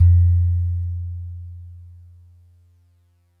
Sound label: DreamLike DX100 F1